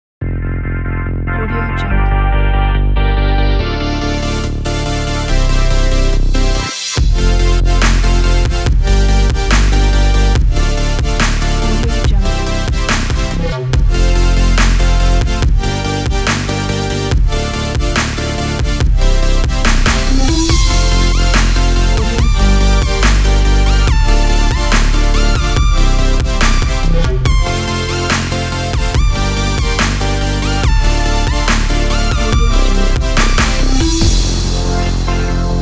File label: light future bass